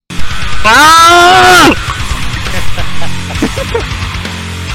grito

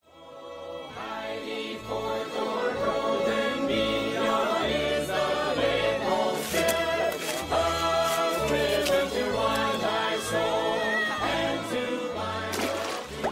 musica baile

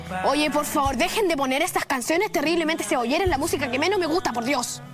musica cebollera

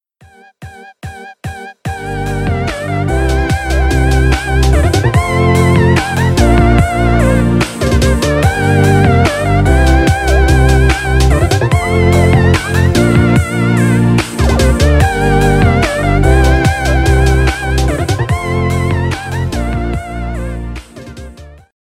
pausa

